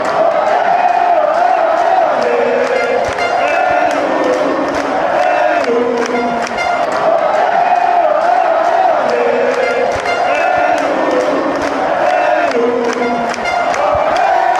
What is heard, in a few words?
ole ole ole ole, fanchants, peru